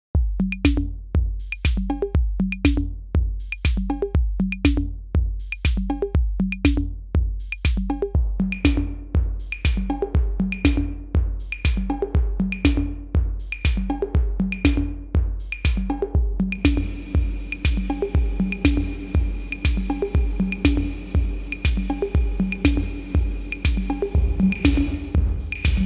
Klangbeispiel REV INTENSITY; ARTURIA

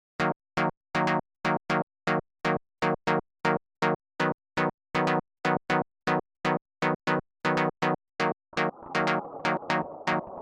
Klangbeispiel Chords; UJAM FINISHER FLUXX

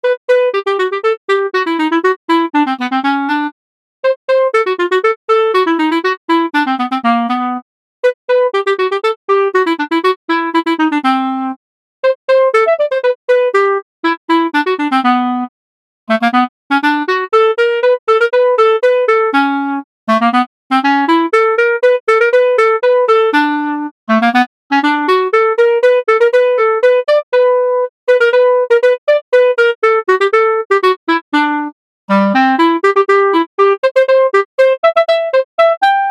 Alpine Volksmusik Klarinette

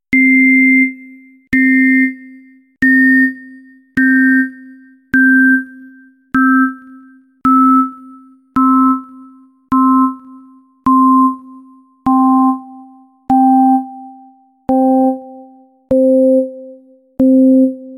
Wavegenerator Beispiel Pure Wave

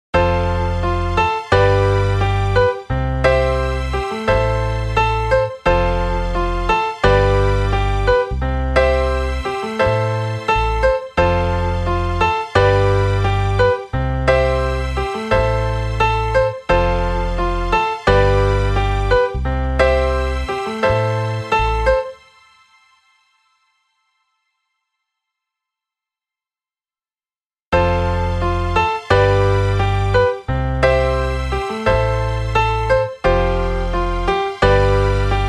Klangbeispiel F G C am

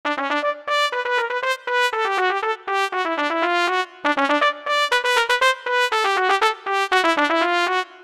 Alpine Volksmusik Trompete Vergleich

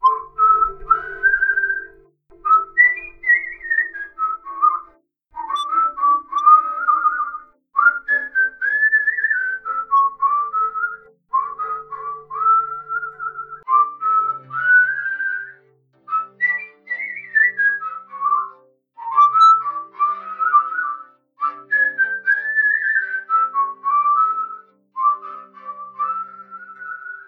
Kaleidoscope Moodal Vergleich Pfeiffen

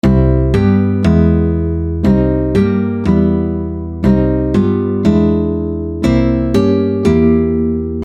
Alpine Volksmusik Gitt Nylon

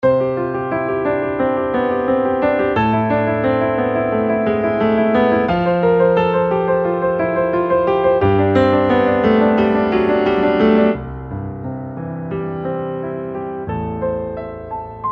Pop Rock Ballad Ending Fluegel; Toontrack EZKeys

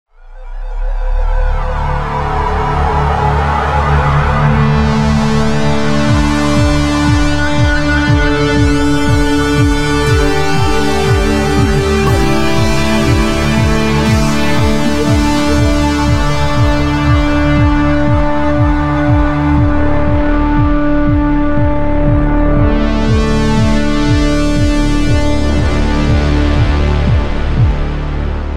Sonigen Modular Klangbeispiel

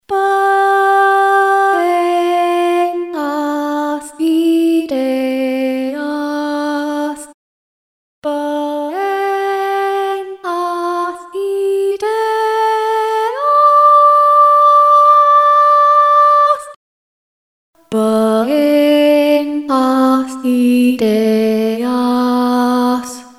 RealiVox Blue Klangbeispiel

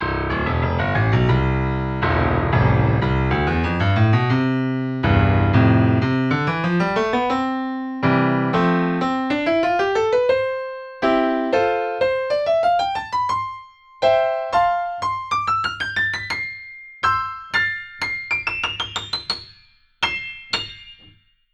Lauf C0 C8 Bechstein

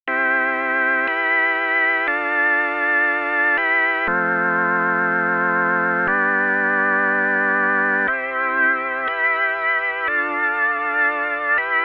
Rotary CLS-222 Synth Orgel; ARTURIA

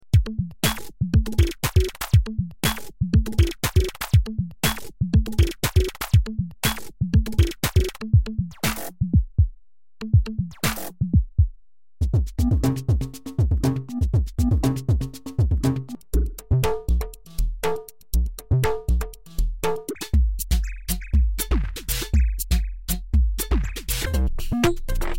Klangbeispiel Aerobic

